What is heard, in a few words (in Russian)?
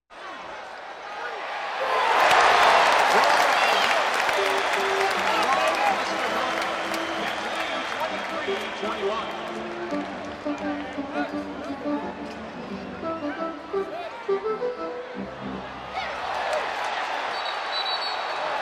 radostnaya reaktsiya